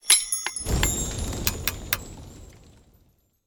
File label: Magical Enhance or Upgrade